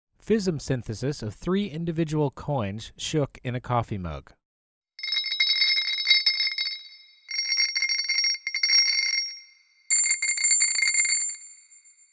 Coins in Mug Model

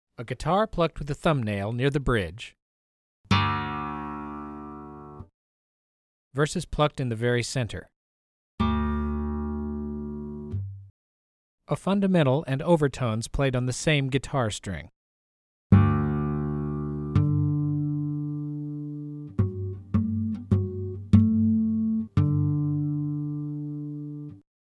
Guitar Plucks and Overtones

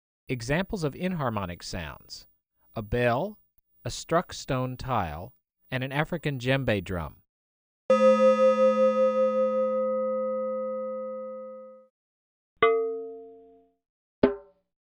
Inharmonic Sounds

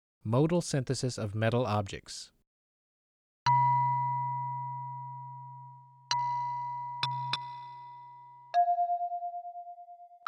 Modal Metal Object Synthesis

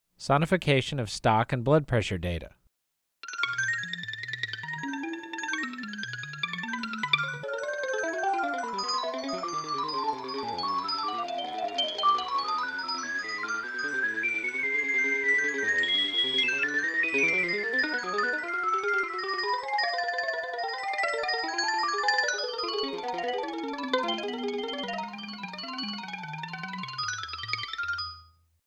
Sonification of Data

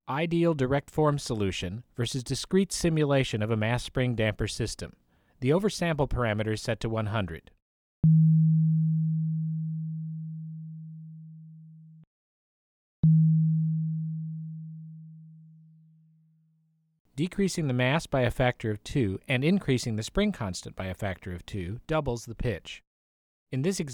Mass Spring Damper

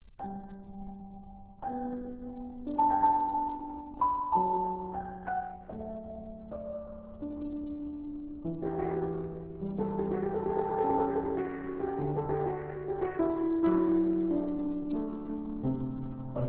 aria 1 bit

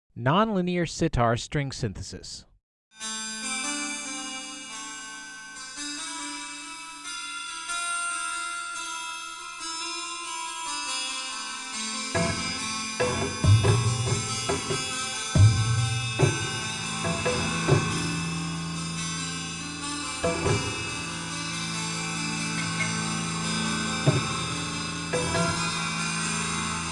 Sitar Model

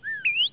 card

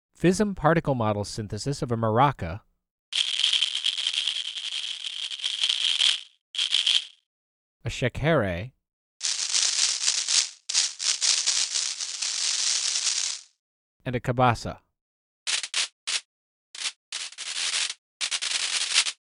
Shakers

